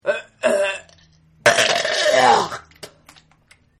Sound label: Vomit; Vomiting; Human; Partners In Rhyme